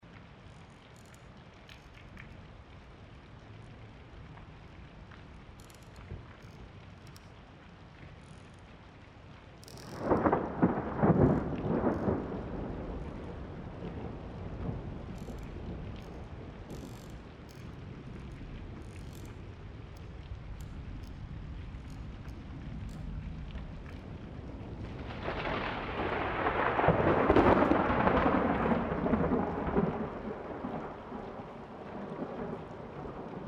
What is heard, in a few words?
Abandoned Mansion Rain No Fire; side quest society